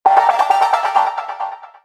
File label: Notification